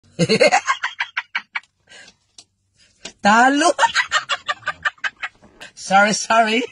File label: laugh sorry sorry